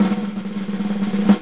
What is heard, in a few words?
DRUMROLL; Free Hearts; Gambling